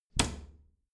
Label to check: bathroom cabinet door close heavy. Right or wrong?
Right